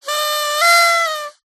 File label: leisure party whistle squawker; zapsplat